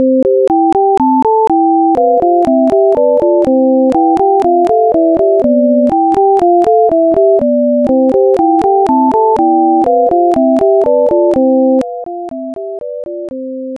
twinkle echo

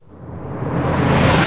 door reversed

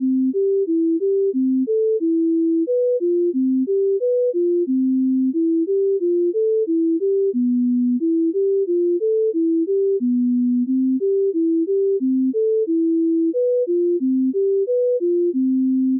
twinkle harmony

